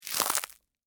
Crack Crunch Soft, Texture, Crack, Crunch, Soft, Ice, Cream, Cone; Food; BlastWave